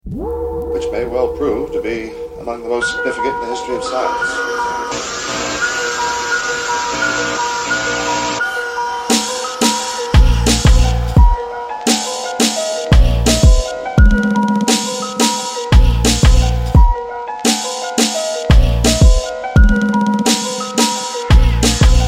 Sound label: Ritual (Instrumental); v don; lost gemz drum kit vol 3